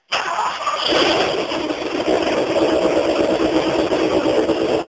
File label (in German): winde